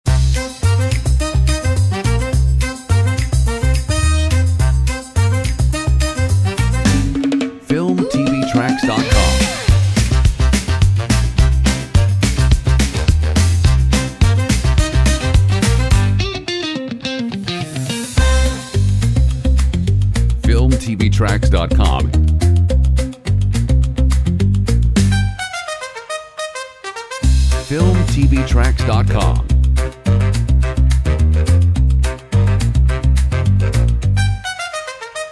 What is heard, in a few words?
Mambo funk; Funky